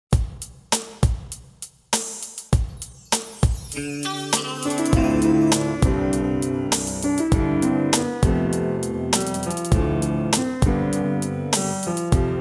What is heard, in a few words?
piano 432 hz; free day